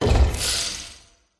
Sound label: futuristic deep unlock magic spell cast conjure craft mobile app; epic stock media